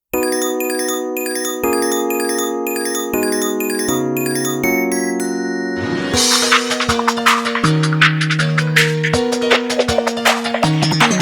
instrumental

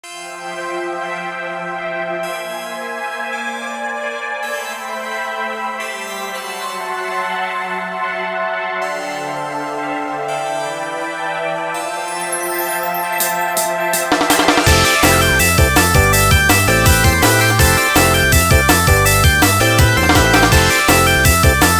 instrumental

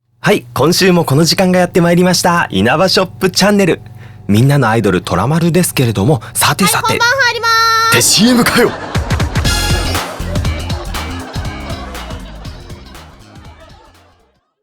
Off vocal

